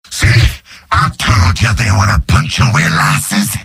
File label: demoman cheers; mvm